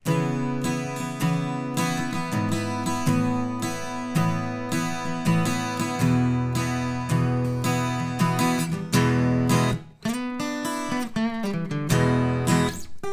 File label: alt gtr